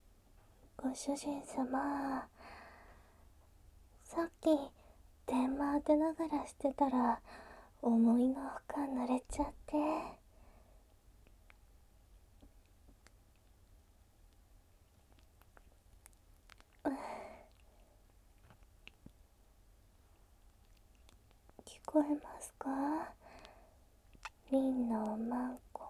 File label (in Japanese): くちゅ音 カウント ステレオ